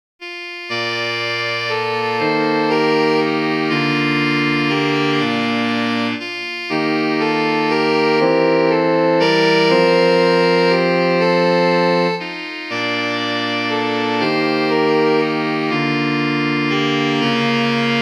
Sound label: Carol Sax